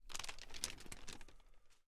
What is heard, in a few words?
News Page Turn